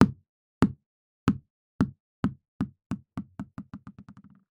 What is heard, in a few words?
ball bouncing to a stop; Som da bola